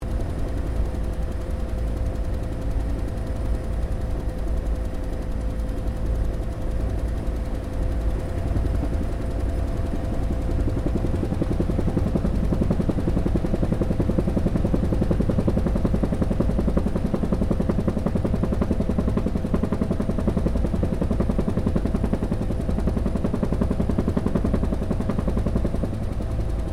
HELICOPTER 1967 BELL UH1 IROQUIOS PREFLIGHT INTERIOR; RAN UH-1 Iroguios; Vehicles; Aircraft; Helicopter Sound Effects; Helicopters (Sound Effects:Vehicles)